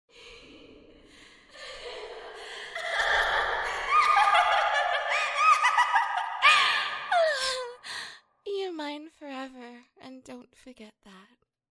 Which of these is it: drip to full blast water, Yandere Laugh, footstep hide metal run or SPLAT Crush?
Yandere Laugh